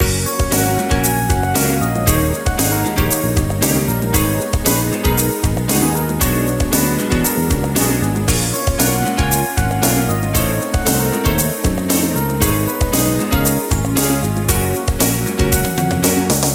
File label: Platform Game Theme Loop